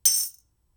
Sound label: Beat Ring, drums singles; The Roots Drumloops